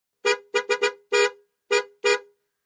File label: Aston Montego Int Hrn; horns